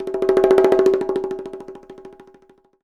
AMB CONG, AMB PERCS; Univers Sons; Basicussions

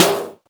808 Noise Snare; Drums; Snares

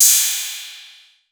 808, CYMBAL; CYMBAL P2

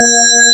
CHYME, Fairlight CMI, BELLS

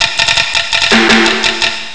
drum; Amiga Soundtracker Sample Packs

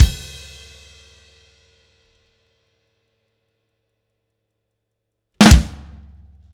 Beat Ending, Indie Rock; Silos; Sonic Foundry (Sony Creative Software); Indie Rock Anthems; Session 11 - Silos

